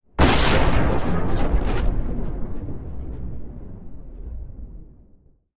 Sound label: Large Sci-Fi Explosion